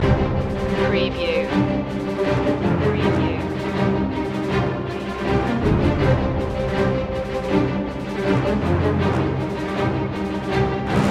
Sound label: battle march loop